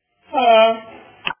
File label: hah